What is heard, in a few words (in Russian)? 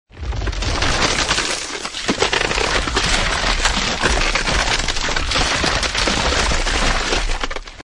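ледокол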